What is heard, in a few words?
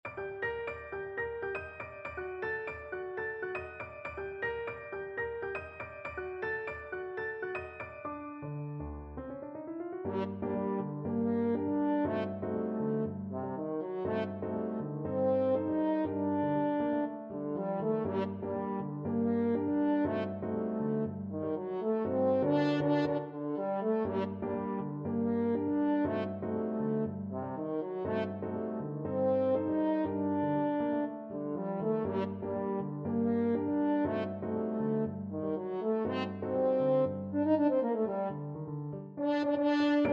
hot sauce salsa, french horn